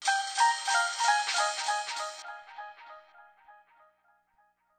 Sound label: Stab; Thirty-One Flavors